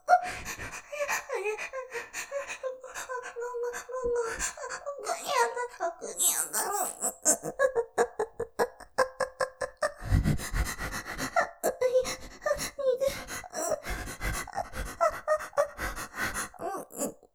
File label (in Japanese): 喘ぎ 溢れ出る系; いやぁ、ごんごん当たってる; ループで楽しむ！シェフの気まぐれ抜き抜きコース